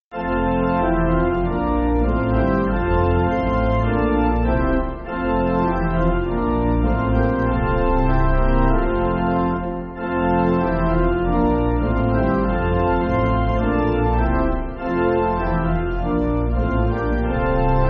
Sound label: Light Of Those, pipe organ